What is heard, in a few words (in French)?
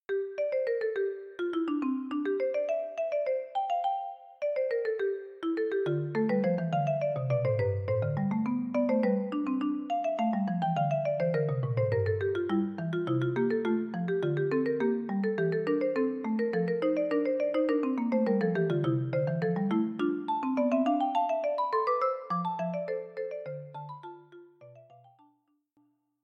Fugue en Sol Dorien 3 marimbas